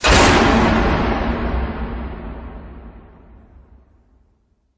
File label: Scary Jumpscare; Fnaw 3; five nights at warios